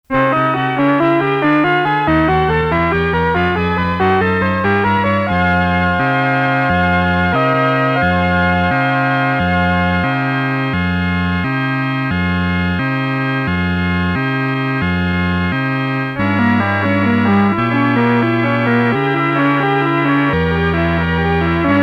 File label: Instrumental